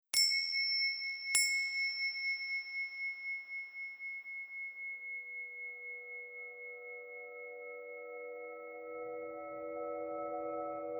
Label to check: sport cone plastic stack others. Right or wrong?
Wrong